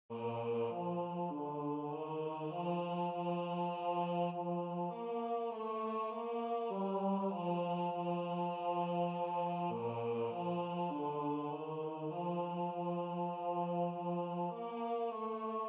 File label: Tenor